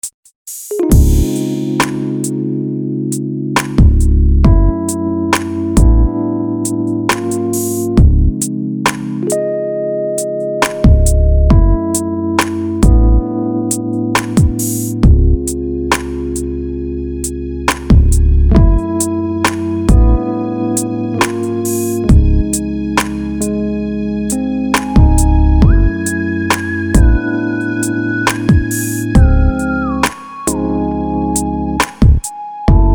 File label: RnB Atmo